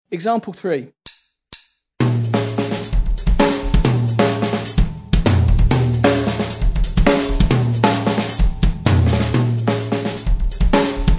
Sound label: drum set